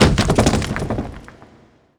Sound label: medium rock impact